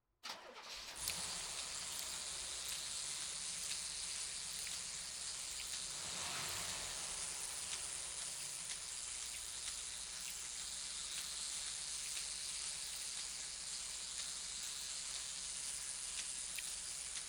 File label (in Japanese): 【AI＆SE ONLY】レトロバイヴ責め